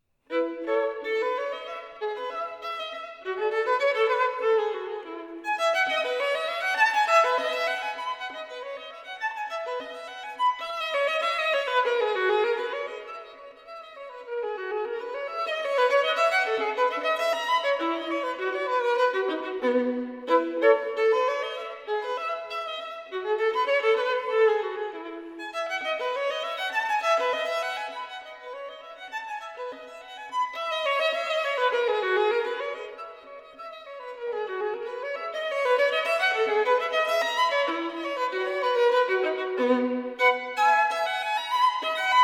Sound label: Partita In E Major, Bourree, Suyoen Kim; Partita No3; Bwv 1006